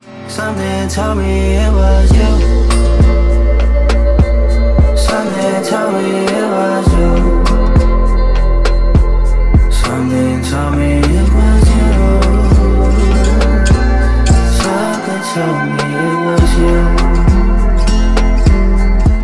english ringtone

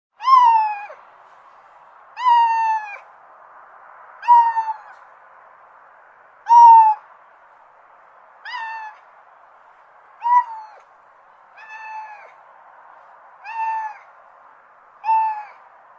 Kitty Meow Ringtone

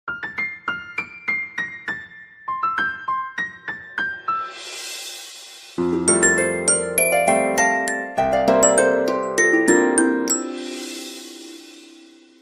Surging OnePlus Alarm Ringtone

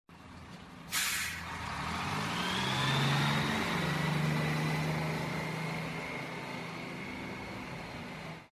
galaxy k zoom notification

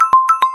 Notification; Samsung Galaxy XCover 5

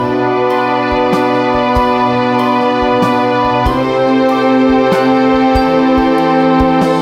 Only Dance Vivo V11 Ringtone

